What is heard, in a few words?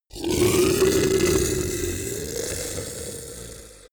beast choke